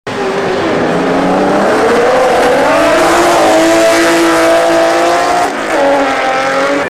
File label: Ferrari 250tr